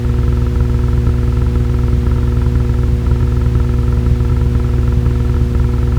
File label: metadata storm